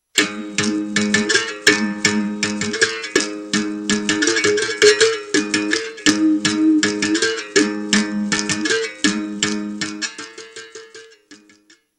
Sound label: berimbau; instruments